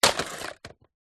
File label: impacts wood ukulele thin smash break